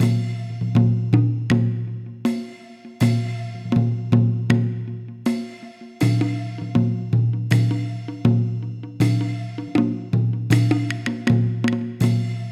PERCS 80BPM; INIS - Opium

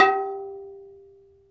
PERC, Dance Mega Drums; Best Service; PERC MIX

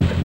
ANT PUNCH; Spectrasonic Distorted Reality 2; LO-TEK 1

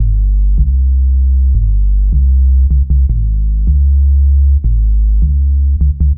Bass, Futurist Drum & Bass

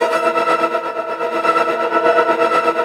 SUPER TREM; Spectrasonic Distorted Reality 1

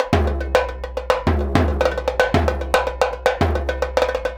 110 BONGO, Percussion Loops; BONGOS

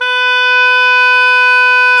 oboe, Roland Orchestral Winds

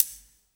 SD RIM, RIM SHOTS; Best Service; Dance Mega Drums

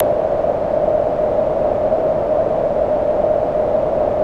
SONAR; Music Store Sound Service; Einstein

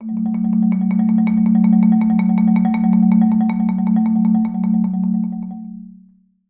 A2, Marimba roll; Sampleheads - Dave Samuels Marimba & Vibes

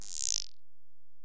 EPerc, Alesis DM-PRO

